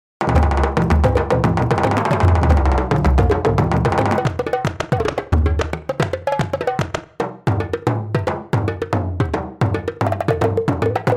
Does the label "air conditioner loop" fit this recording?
No